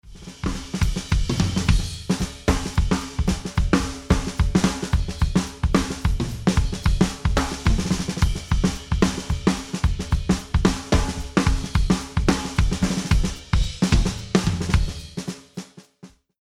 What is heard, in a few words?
Drums SD w Kick